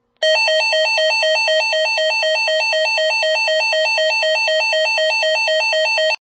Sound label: Unication G1 tone